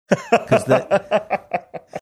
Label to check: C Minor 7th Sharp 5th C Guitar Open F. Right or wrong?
Wrong